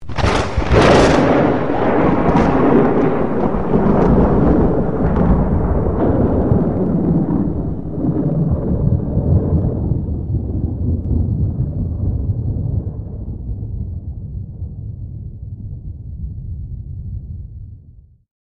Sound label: thunderclap